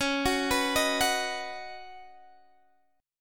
Db 7th Suspended 2nd Suspended 4th, Guitar, Standard; JGuitar